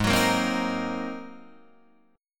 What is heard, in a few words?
G sharp 9th, Guitar, Open D